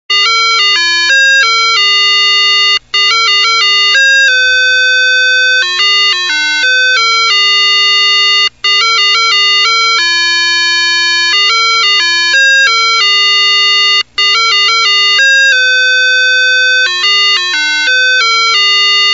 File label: musicbox